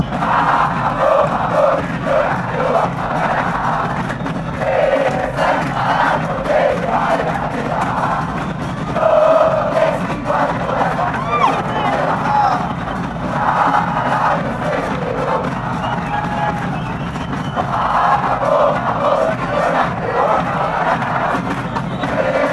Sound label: millionarios chant, colombia; mfc